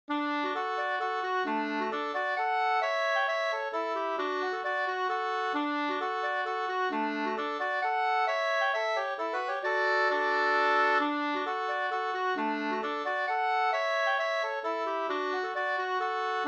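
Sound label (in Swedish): hambopolska, D major; fr Varnhem nr1 av H Falk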